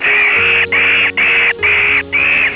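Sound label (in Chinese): xingxing, gorilla; animal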